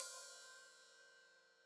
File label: Hat Open, Hi-Hat; Deeply Sampled Drums